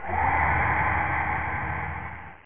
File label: Fan; Bugs Bannis; Squish 2.6.8